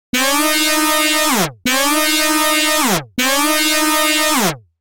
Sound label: warning; darius